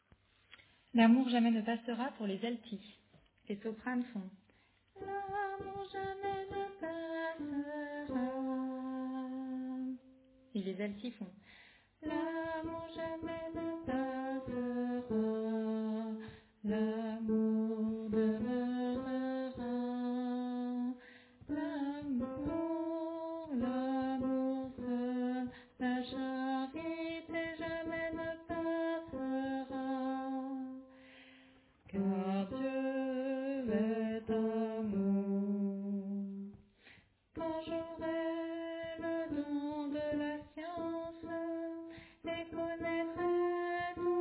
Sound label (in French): Alto